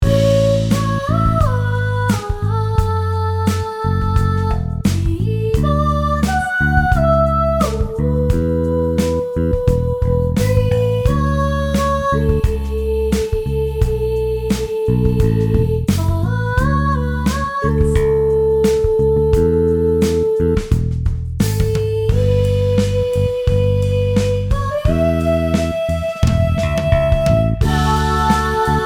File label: verse chorus; mana